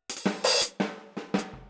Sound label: Boogaloo - Hi-Hat, 82 BPM; Drums; Intro; Sonic Foundry; Drums from the Big Room The Mixes